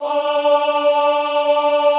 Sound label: AHH D3, Classical Choir; Best Service; Extended Classical Choir